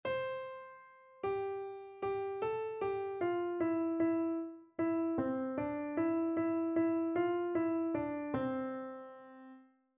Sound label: christmas bells; mayors christmas carol music